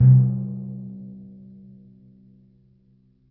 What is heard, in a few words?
B1 p, Timpani, piano; PowerFX; Downtown Orchestra; scales